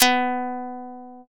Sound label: shamisen